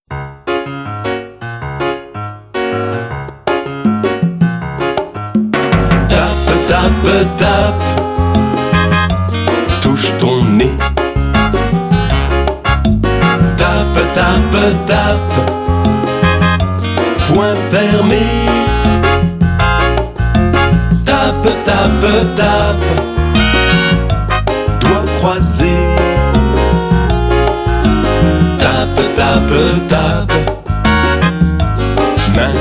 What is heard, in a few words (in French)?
Tape tape tape; Tourne, tourne petit moulin